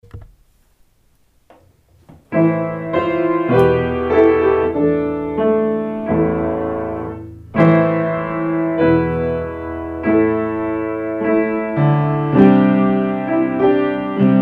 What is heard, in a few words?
piano